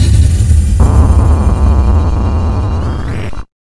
RUN 140 SYN, Big Beat Drumloops; AKAI

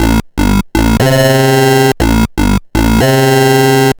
Sh09 Bass Loop, 120BPM

